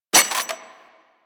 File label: Burst Rifle Mech Secondary; Weapons; Grineer